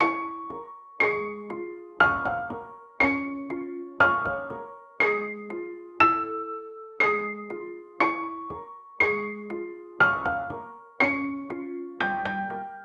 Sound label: soothing lullaby, relaxation